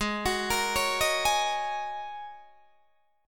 Ab 7th Suspended 2nd Suspended 4th down Guitar Open D